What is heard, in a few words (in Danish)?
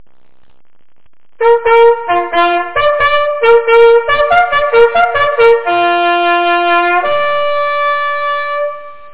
Jagt begynd, jagthorn